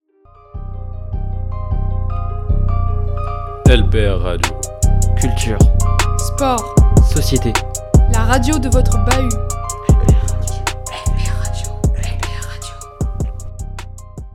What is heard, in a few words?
jingle radio